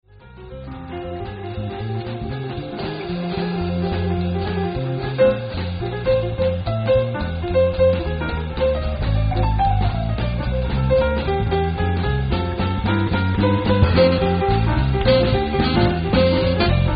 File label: jazz